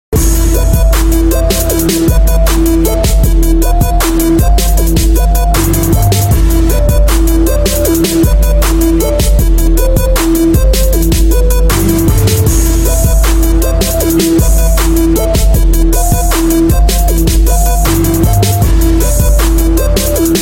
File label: unique hip-hop beat